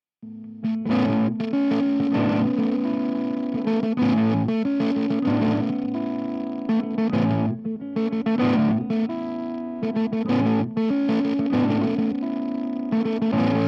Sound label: A96 GTR motif in 5/4